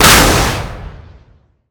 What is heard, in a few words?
Weapons Missile Launch; DCS